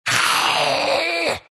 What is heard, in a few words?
snarl; Undying